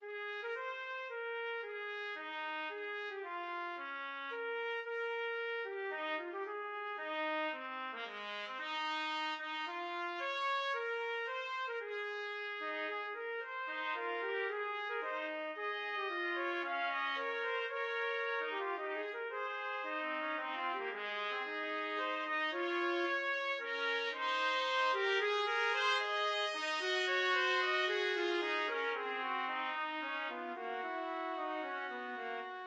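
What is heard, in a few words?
The Banks of Green Willow trumpet duet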